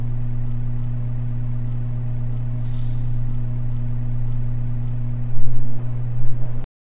room-tone, sound-effects

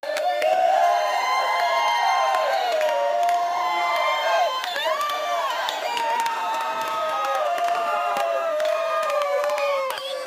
a crowd chanting too

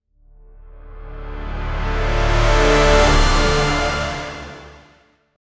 riser action epic; sfx; sound effect

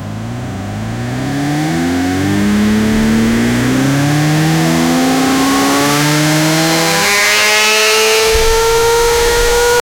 short engine, redline

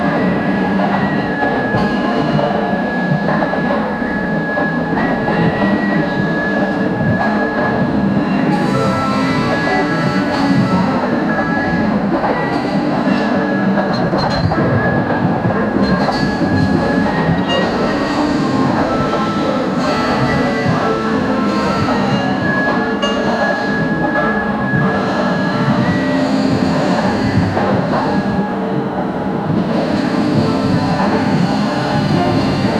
factory machins and noise

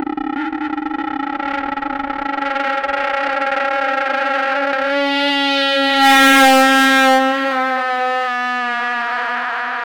rigid sea beast, monster vocal

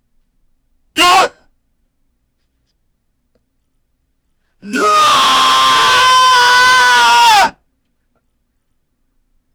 wrestler pain scream soun, sports

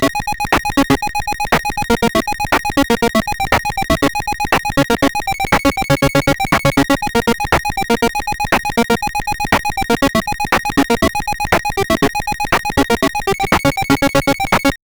smooth middle lo fi 8 bit, chiptune

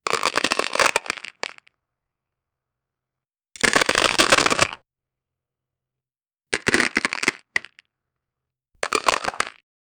create candy crush mobile, candy

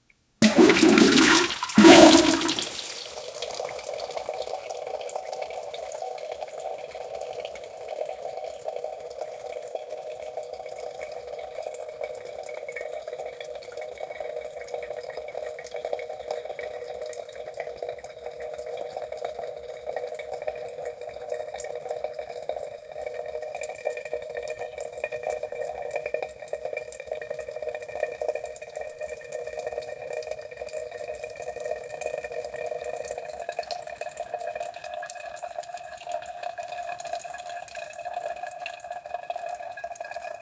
poop flying out of your, gross

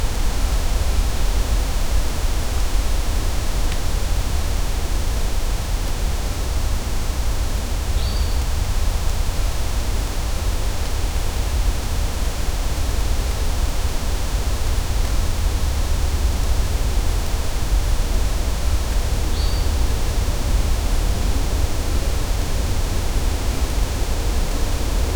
horror forest ambiance, animal calls

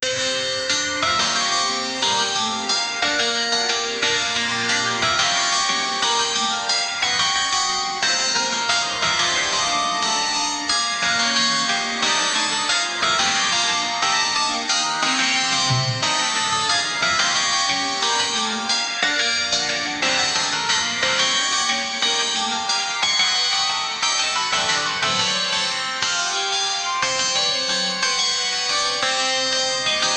metallic boss theme music; sound effect; boss battle

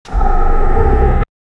monster horror sound

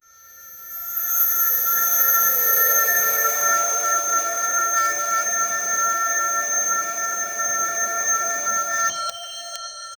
digital chime fading into

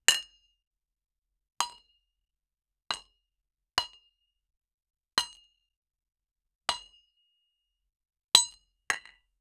bowling ball strike

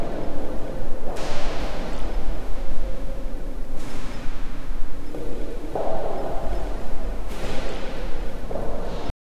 low hum of air circulatio

